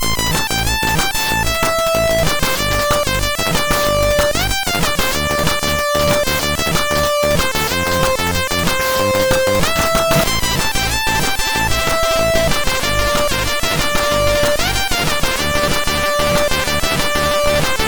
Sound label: Fast Melody, sid MOS6581R3